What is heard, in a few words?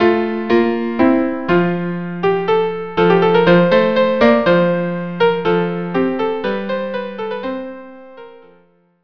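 viola, cello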